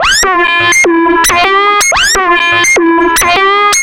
Synths, Underground UK House & Electro

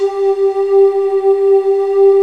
kamikaze, Korg M-1; Rubber Chicken Software Sample Bank

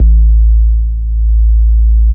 sub A, techno trance bass

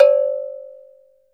AFRICA, Drums & Perc; AGGLO; AKAI S6000 CD-ROM - Ultimate

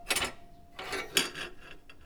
fooley; M8; percussion and foley

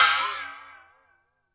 BOX FX; Univers Sons - Raricussions

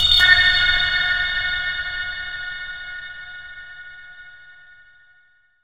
Dub Fx; Zero-G Sound Sense Grime Scene; 90 s Sample CDs

